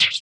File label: RSS, 160 BPM; Ueberschall; Drum & Bass Flash Trackz